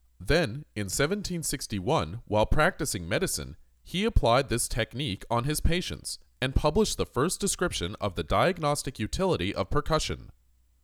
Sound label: Percussion